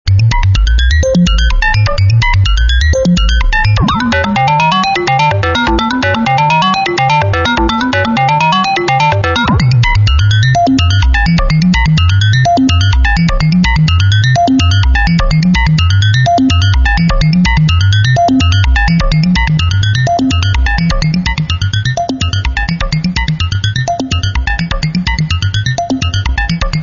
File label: ringmod